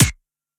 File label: Rich Top End Snare Sound A#, steel snare drum tuned to the A# note